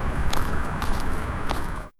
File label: left and right steps